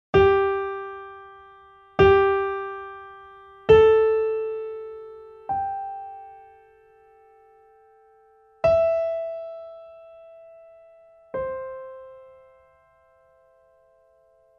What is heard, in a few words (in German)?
klavier solo